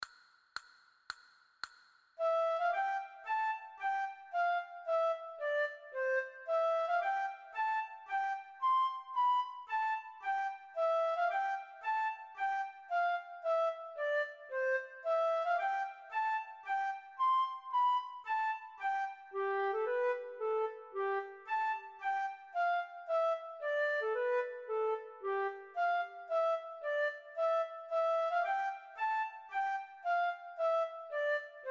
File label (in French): Marche, flute a bec